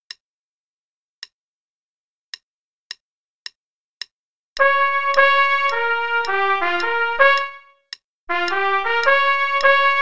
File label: Play-a-long, Blues, qn=108